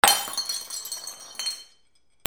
BREAKING

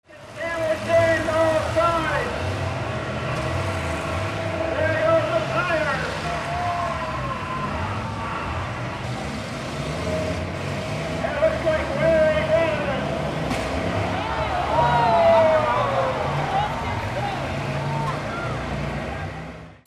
CARS

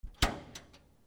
CLICKS

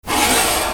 KITCHEN

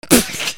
CARTOON

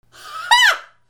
PEOPLE

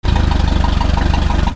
BOATS

